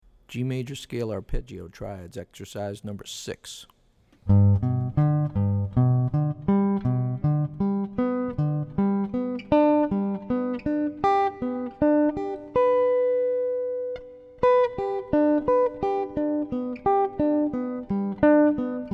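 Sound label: G Major Scale Arp Triads; lead skills materials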